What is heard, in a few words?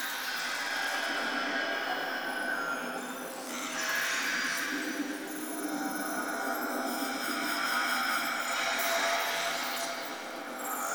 Large Room Lower Amb; Warframe; RailJack; Ambience